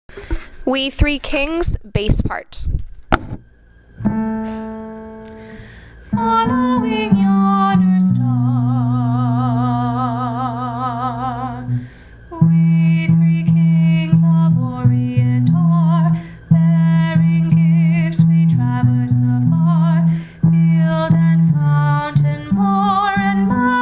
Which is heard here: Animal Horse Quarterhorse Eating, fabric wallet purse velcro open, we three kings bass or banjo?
we three kings bass